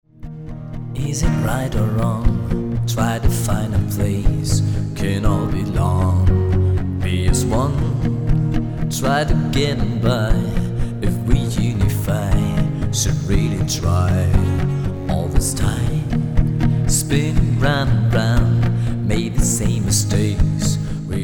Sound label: Sweet Harmony